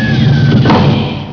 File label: TEKDOOR